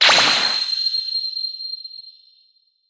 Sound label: Begin; Boomerang Fu